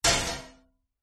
impacts metal hit strike clatter rattle resonating roomy; zapsplat